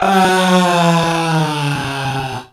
VOC, Drum & Bass; Ueberschall; Drum & Bass Flash Trackz

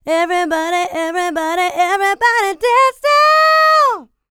LICKS, Vocal R&B Dance; LT DANCE

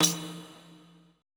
E TAMB, ETH PERC

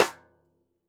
xstick snares on; JazzFunkKit

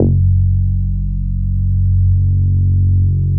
HOT F#1, BASSES; Best Service Alpha Dance vol.2

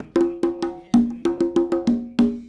Congas, 70s Analog Funk; Sonic Foundry (Sony Creative Software); What it Is!

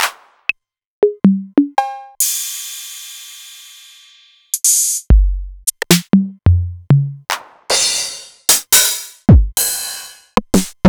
Novation Drumstation; DrumstationV1

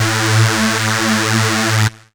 saw lead synth

